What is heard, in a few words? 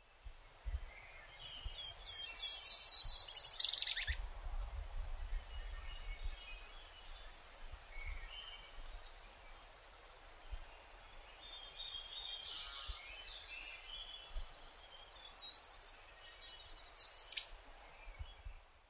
golden crowned kinglet